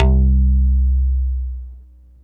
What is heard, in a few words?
TAKKER C1, Yamaha VP-1, TAKK BASS; Music Store